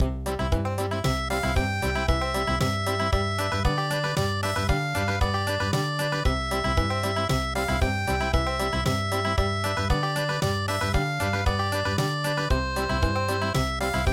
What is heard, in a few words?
Valse Country; midi; traditional